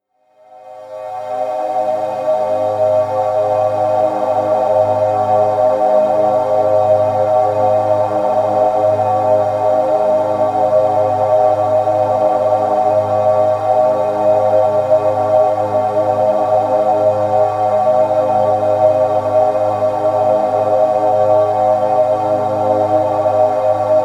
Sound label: wide air, music elements